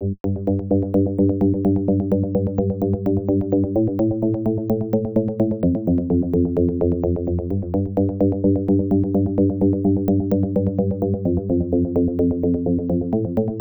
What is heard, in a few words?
bass